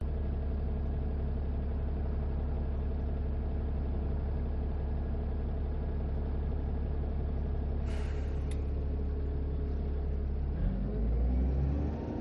urban bus journey interior perspective newcastle upon tyne uk